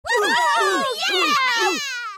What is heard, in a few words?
end background; Unikitty! Sparkle Blaster; NextlevelGames